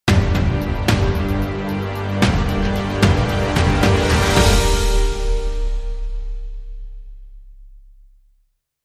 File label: Dramatic Sting